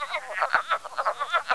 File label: woodfrog